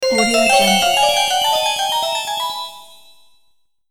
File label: fairy magical lift